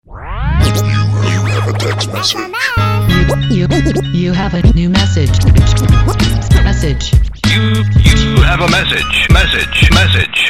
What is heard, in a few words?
Sms Scratch Message